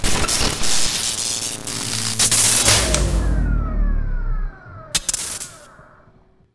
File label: electric blackout; lucius